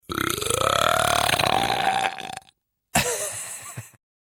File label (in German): Ruelps Lach